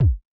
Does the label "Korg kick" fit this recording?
Yes